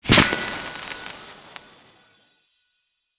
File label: explosion small win; netent